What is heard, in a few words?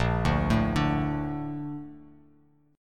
A sharp Major 7th; A#M7; arpeggiated; JGuitar